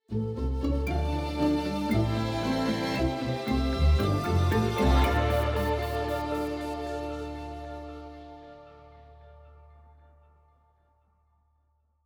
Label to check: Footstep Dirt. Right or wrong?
Wrong